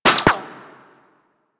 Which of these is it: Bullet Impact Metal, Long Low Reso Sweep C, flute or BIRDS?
Bullet Impact Metal